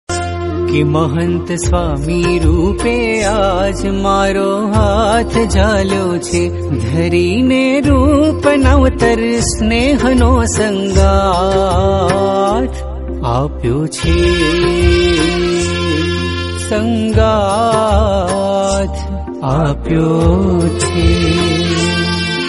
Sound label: kirtan; mahant swami